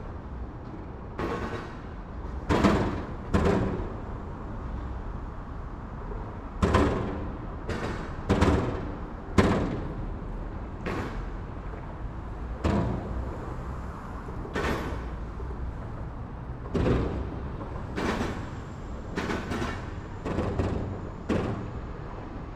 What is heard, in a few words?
Urban traffic moderate elevated metal plate thuds; urban and suburban; boom library